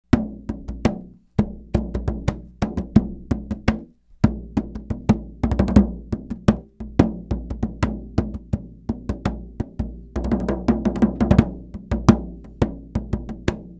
drum, djembe

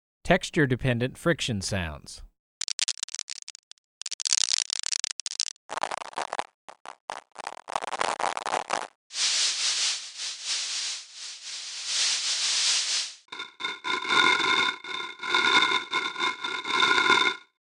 Texture Dependent Friction Models

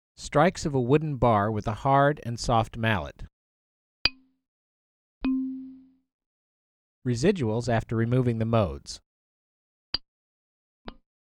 Hard and Soft Bar Strikes

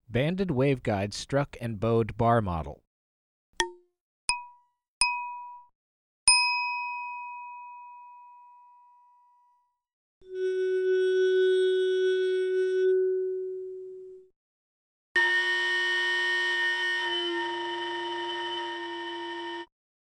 Banded Waveguide Bar Model

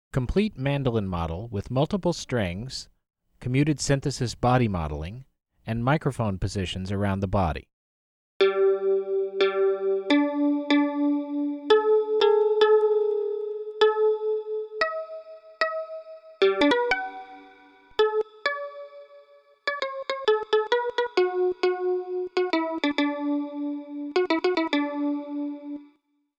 Complete Mandolin Model

